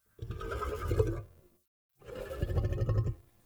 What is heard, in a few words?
Wolf Growl Relaxed Jog; Animals